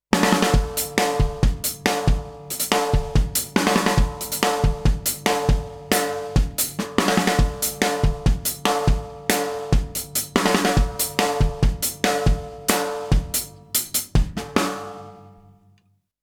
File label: Drums